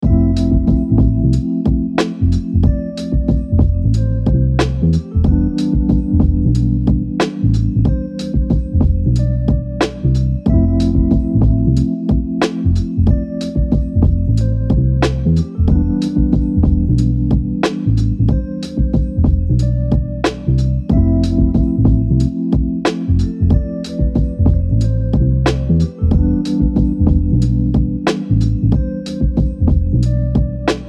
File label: Hip Hop; Vol2 Convos Main